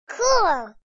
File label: exclaim; Chibi Knight